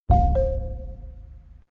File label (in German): Botschaft, klingelton